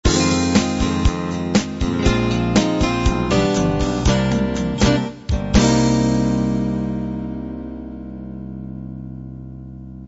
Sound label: light rock end